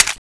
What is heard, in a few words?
clip out; tfa cso; horse gun